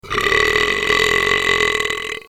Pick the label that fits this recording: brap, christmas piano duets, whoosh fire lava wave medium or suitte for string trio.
brap